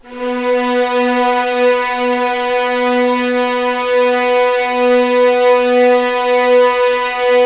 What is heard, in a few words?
B3 LEG FVL R, The Ultimate String Collection; East West